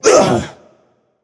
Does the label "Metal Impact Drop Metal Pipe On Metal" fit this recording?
No